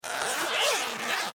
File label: Duffle Bag Zipper Close; Sports; Zippers; Objects